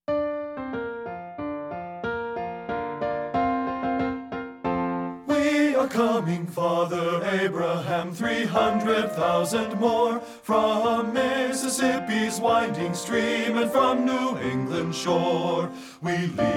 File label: BASS; men